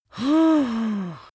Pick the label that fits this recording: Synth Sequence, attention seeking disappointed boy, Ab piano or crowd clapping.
attention seeking disappointed boy